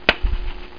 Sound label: Hacky Sack